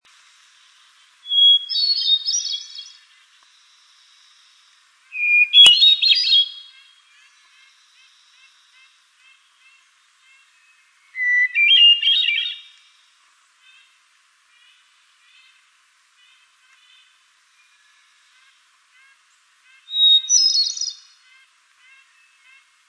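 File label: Hermit Thrush song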